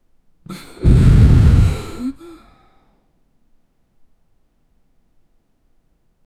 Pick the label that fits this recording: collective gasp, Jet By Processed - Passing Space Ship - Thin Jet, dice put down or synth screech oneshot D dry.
collective gasp